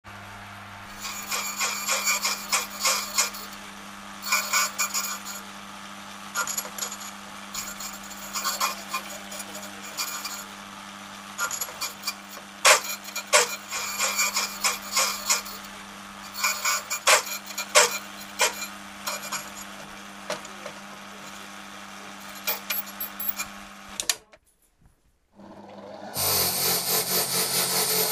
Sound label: key cut